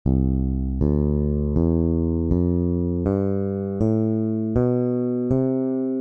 gamme de do basse

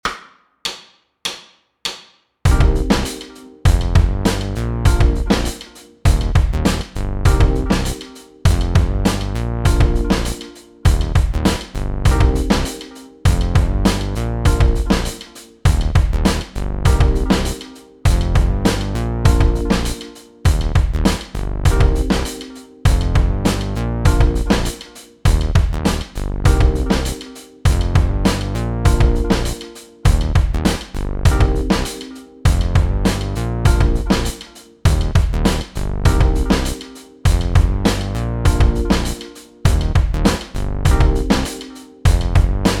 playback en Do

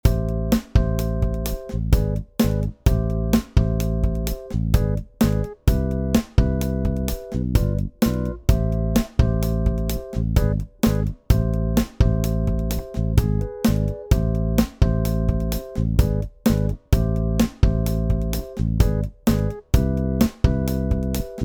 boucles

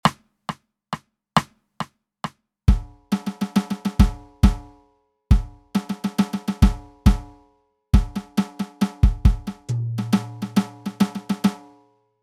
festin batterie

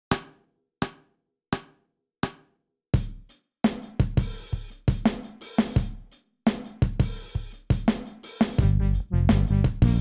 compo G mineur pentatonique

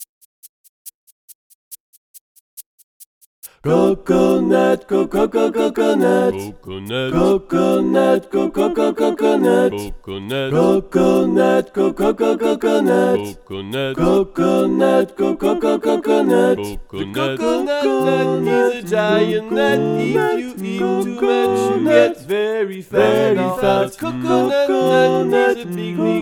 coconut song tts les voix

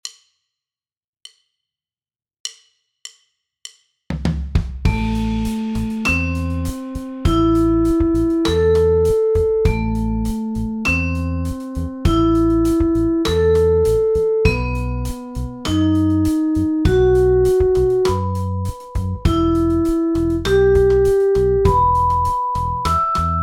les arpeges

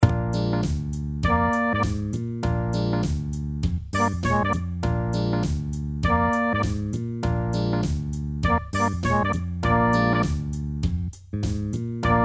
cuivres

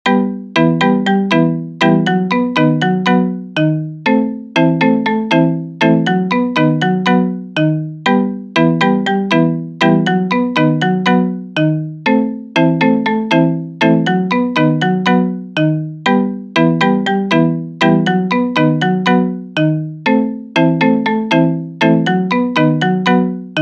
miel percussif

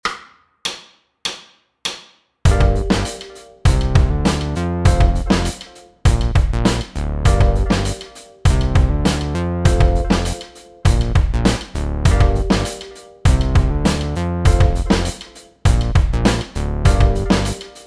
playback en Sol

